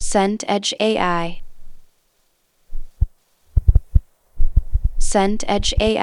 wind noise mic

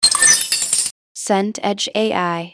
spanner drop metal

